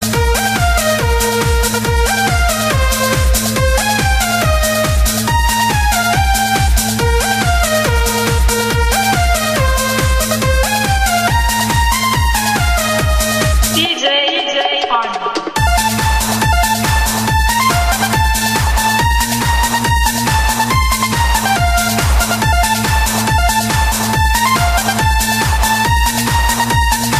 jingle bells remix

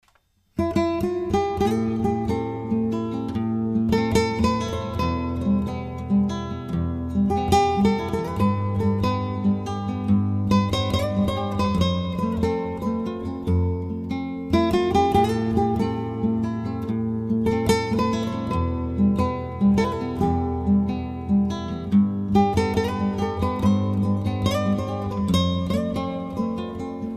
nice guitar

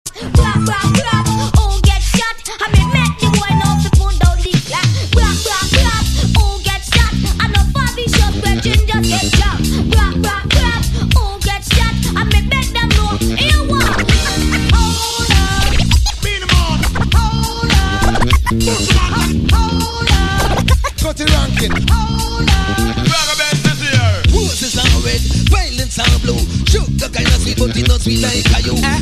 ragga hip hop

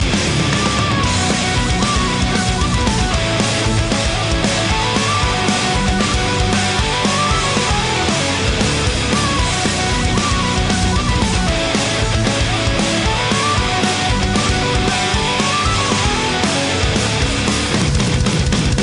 celtic metal flute

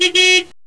car beep

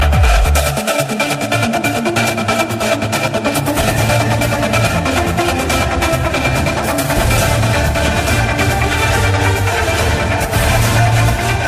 electro remix

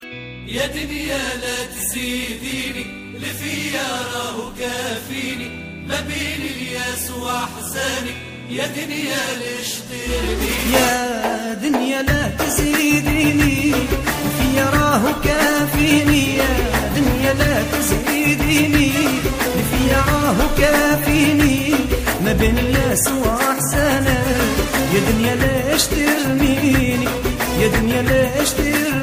libyan arabic music

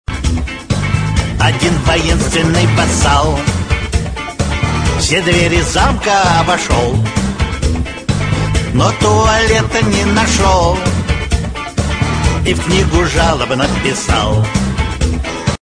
chastushki

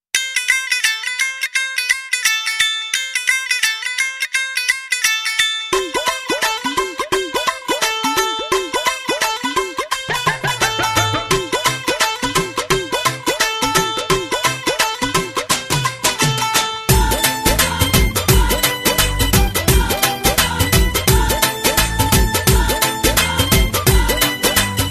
punjabi tune

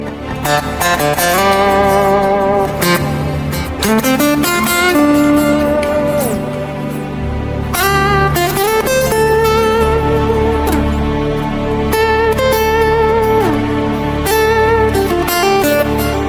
romanian

